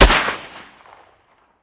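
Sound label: weapon dmr sup fire player atmo ext; gmod; viper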